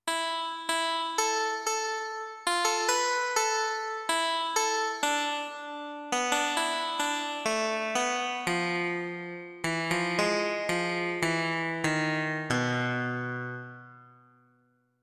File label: Tambra